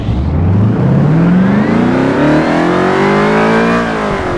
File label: first, vehicles; TDMCarpack; TDMCars